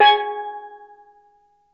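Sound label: steel drum G#3, tonal percs; Crypton - DrumBase5000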